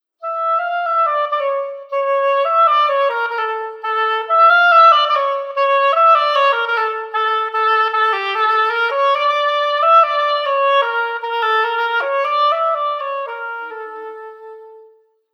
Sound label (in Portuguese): oboe; Conhecendo Instrumentos Musicais